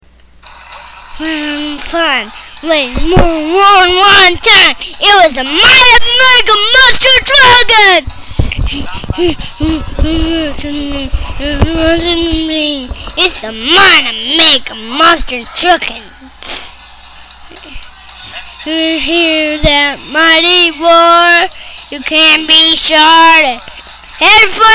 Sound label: Monster Truck; Mighty Mega Monster Truck